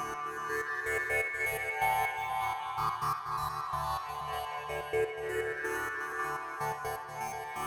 ethno, 125, A

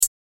Hat, Dance

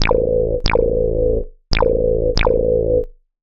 ELECTRO BASS 140 A

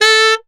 TSAX P A3, Tenor Sax Portato; Orchestral; DirectWave; Varazdin Orchestral

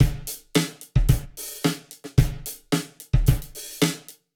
110 Groove Room, Warped Live Drums; Loops; Touch Loops; CosmicFunk

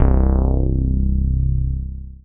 BASS MONO

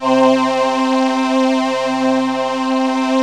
VP330

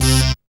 dance chord

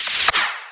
clap snare; Anthemic

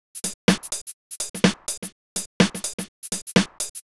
DEEPHOUSE BEATS 125

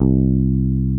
SOFT BASS, ELECTRIC BASS

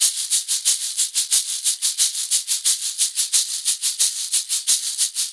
ETHNO EXTRA 90; ethno2

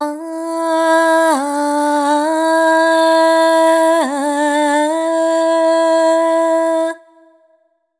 ETHNO FEMALE, Vocals; ethno2

